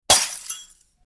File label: Glass breaking